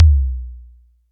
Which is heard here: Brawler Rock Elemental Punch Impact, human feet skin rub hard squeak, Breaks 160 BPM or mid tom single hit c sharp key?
mid tom single hit c sharp key